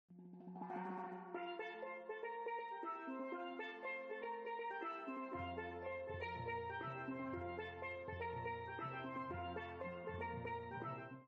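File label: bahamas background music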